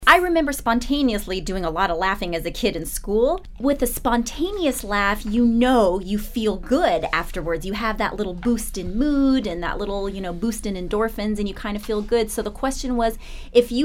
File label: Integrative laughter